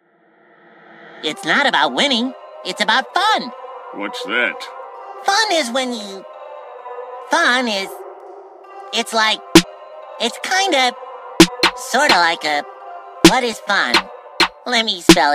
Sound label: The Banjo Beat